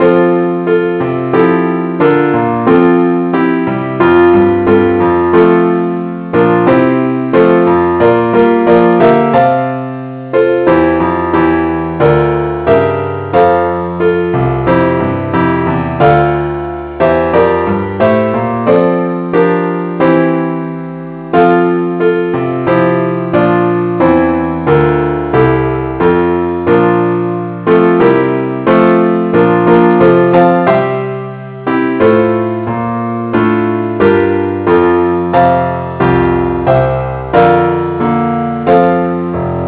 keyboard only key f; christe Sanctorum

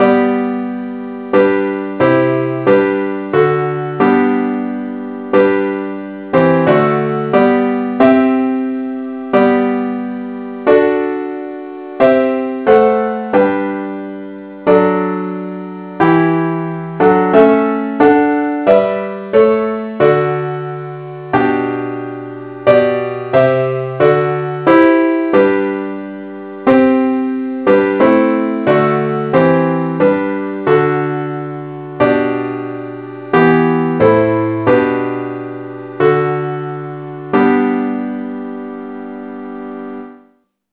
parts only key g; christe Sanctorum

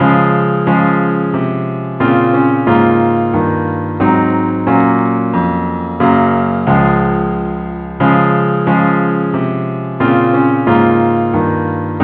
parts only key des

